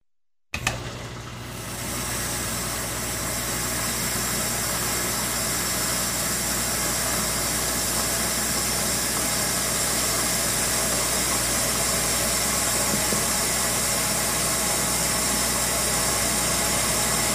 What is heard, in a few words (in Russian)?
zvuk posudomoechnoj mashini napolnenie vodoj mojka posudi sliv vodi